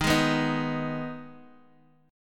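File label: E flat Suspended 4th Sharp 5th, chord; 8,8,7,6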